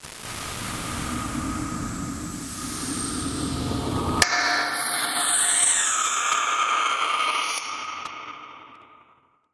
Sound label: Rocketing Noise Loop With Twist